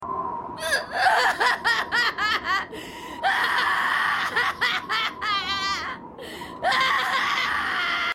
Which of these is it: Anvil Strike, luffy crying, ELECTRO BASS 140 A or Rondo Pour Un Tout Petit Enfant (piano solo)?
luffy crying